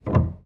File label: piano release